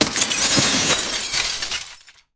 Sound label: tactical vanish and shatt